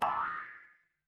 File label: cartoon boing